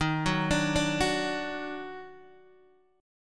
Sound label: Eb Minor Major 7th Eb down Guitar DADGAD, chord